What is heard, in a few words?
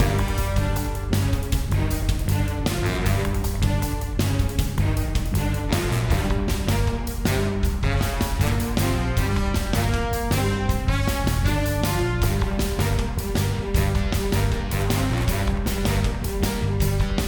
Bee Hive Platforming MIXED